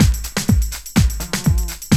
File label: 125 RUBBE, loops; AMG; Now CD-ROM (Roland)